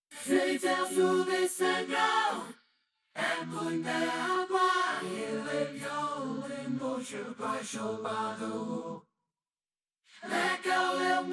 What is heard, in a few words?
Backing Vocals